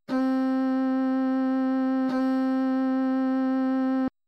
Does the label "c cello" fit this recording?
Yes